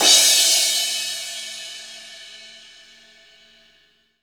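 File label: cymbal crash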